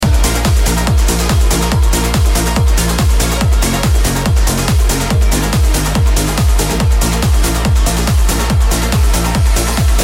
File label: Uplifting Only, Transition; Uplifting Only 671; No-Talking DJ Mix